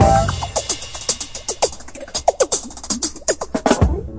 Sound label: 114 bpm, drum loops and breaks; 111 to 120 bpm